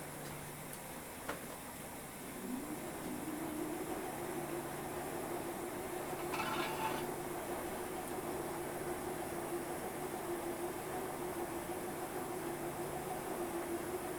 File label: Laundry Interior